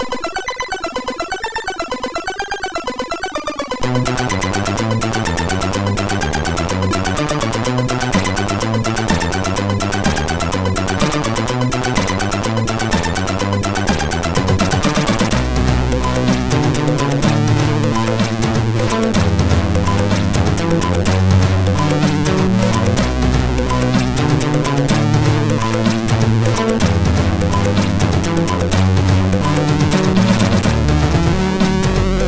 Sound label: sid, MOS6581R4